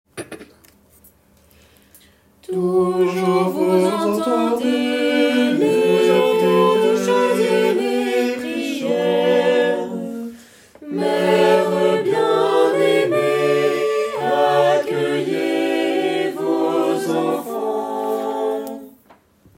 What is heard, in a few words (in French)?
Chant du Jubile couplet TUTTI; sanctuaire